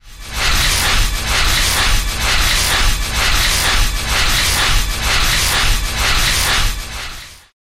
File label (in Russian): zvuk rabotajuschih porshnej